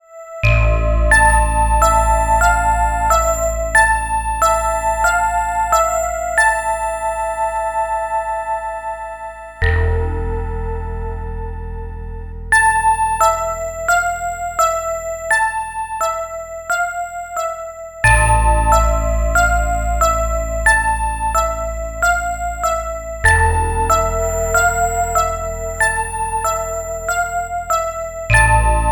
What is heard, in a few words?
scary ringtone